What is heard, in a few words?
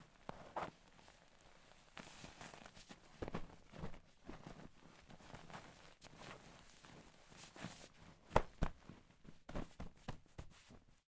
Pillow Fluffing Variated